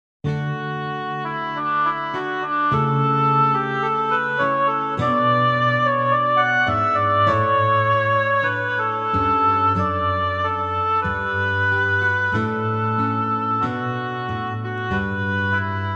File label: Boys Choir, St Patrick's Breastplate; 外文宗教歌曲